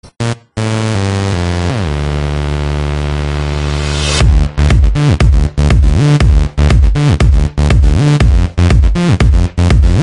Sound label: Uber-Mega Bass